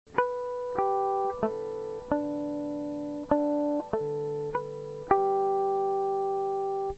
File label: natural harmonic, egitarre